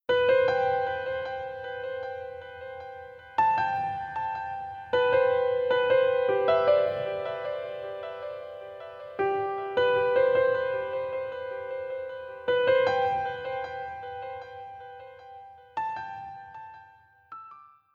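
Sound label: Future Bass melody piano; Pf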